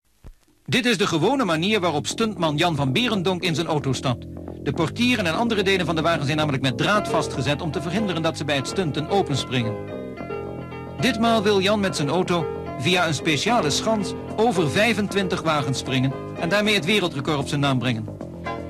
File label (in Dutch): Stuntman in actie